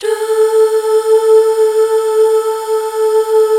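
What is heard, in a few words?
Duh Female; Best Service Gigapack 1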